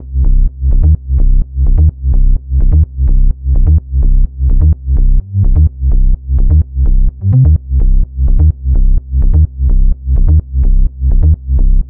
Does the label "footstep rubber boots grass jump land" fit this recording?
No